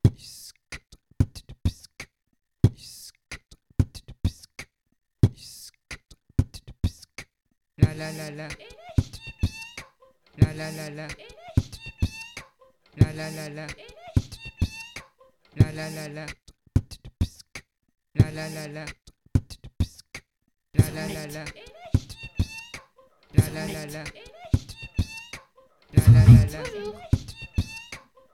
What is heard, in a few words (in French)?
impro classe looper; Musiciens en herbe